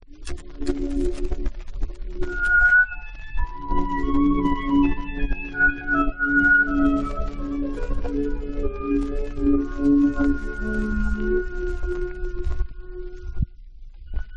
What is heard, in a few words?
Classical Music